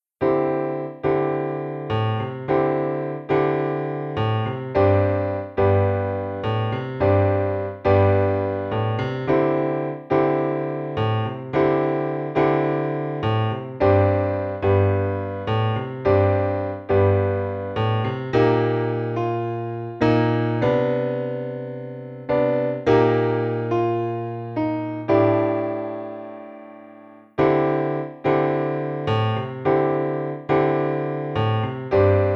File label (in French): Piano seul